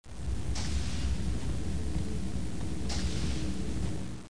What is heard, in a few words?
doors